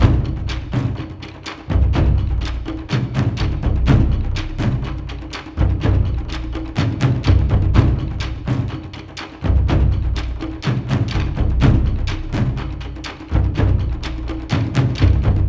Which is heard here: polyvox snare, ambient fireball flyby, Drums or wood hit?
Drums